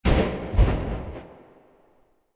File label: Goodyhut Metal; Sid Meier's Civilization 4 Complete; Beyond the Sword